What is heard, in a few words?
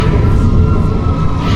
desecrate loop